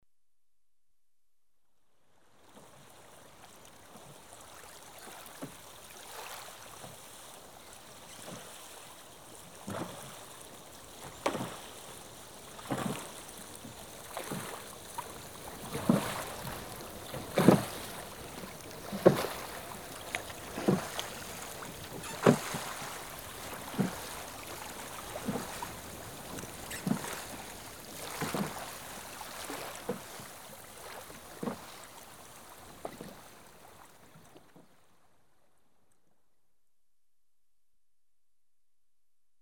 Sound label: row past right to left, Ships & Boats; The BBC Sound Effects Library